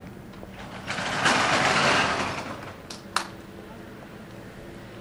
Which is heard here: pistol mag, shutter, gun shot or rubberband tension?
shutter